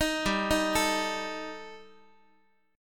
G Suspended 2nd Sharp 5th G, Guitar, 4A, 4E, 5A, 5D, 5G sharp, 6C sharp; arpeggiated; x,x,6,5,7,8